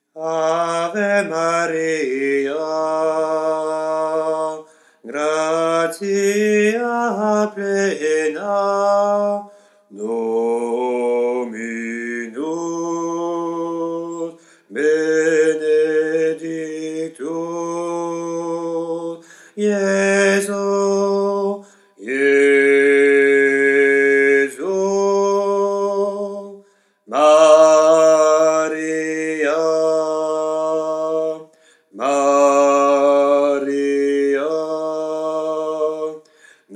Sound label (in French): alto; ave maria oriental